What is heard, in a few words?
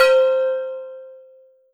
Alesis Sanctuary QCard Loose Bell C5